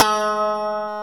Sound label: GTR, Clean E.Gtr, Hookmonics; Roland L-CD701; Clean E.Gtr4